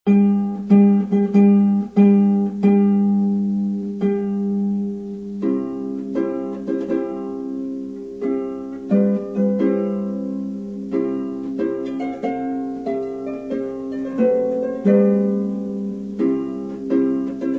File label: wedding march; wagner